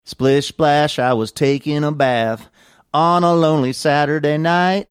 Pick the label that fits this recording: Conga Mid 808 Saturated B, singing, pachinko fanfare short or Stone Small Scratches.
singing